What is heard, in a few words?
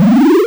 tron go; w00tguy; arcade